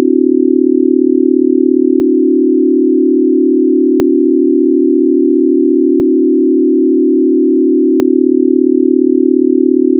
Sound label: buzz